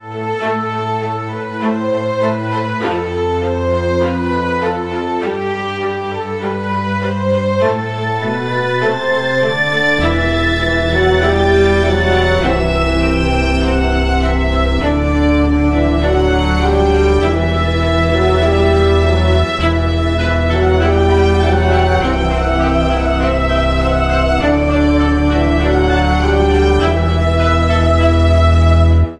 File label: emotional peace